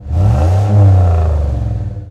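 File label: Truck Cherokee Rev; SFX Kit; Trucks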